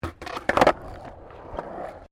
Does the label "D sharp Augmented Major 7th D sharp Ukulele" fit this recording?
No